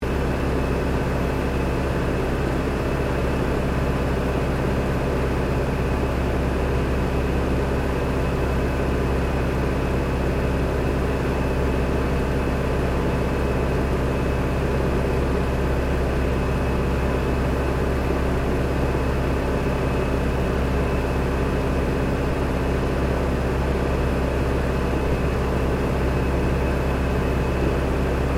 Aircraft CAC CA-25 Winjeel flying interior loop, WW2 era Australian training aircraft; Airplanes